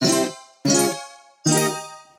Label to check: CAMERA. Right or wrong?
Wrong